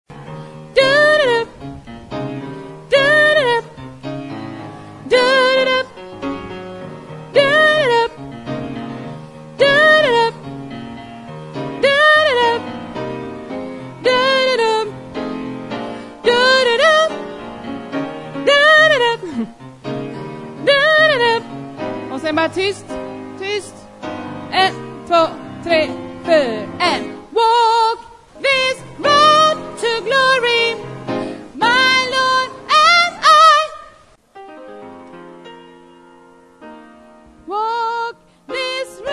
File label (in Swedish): sopran